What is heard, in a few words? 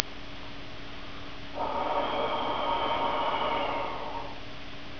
tuba